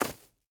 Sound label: stag footstep grass hard; Rust